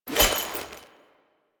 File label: Fishing Spear Bait Throw; Warframe; Weapons; Eidolon Fishing Spears